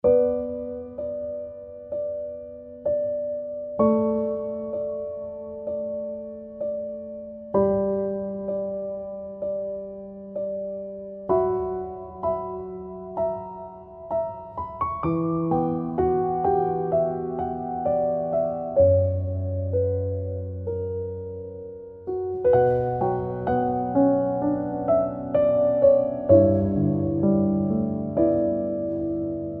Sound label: Sad Piano